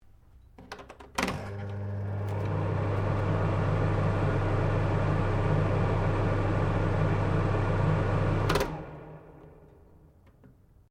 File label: FAN OVEN EXHAUST STARTUP SHUTDOWN; Various fans; Electronics